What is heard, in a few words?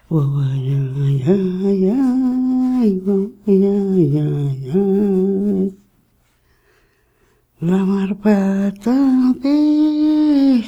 Harvest Songs; Chocos